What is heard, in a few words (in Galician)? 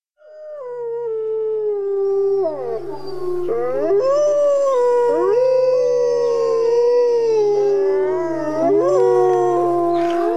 son lobo; animais